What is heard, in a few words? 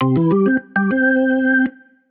hockey; Logi; Loupedeck Sound Pack